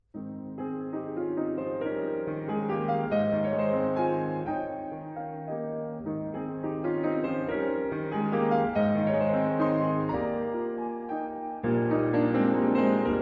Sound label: Feuillage, piano; Juvénilia, petites pièces pour piano